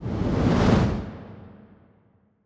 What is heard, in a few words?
kaiju juvenile tail swish long; Stellaris; biogenesis; ships